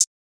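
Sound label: hi-hat, closed hats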